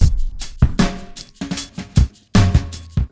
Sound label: Drumloop